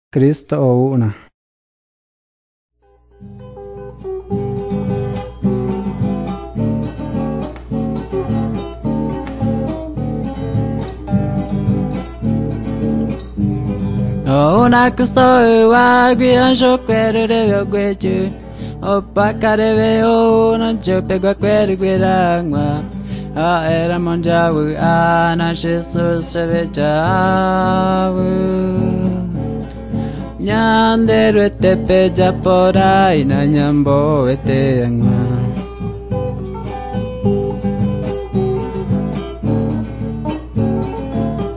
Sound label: songs guarani mbya; bible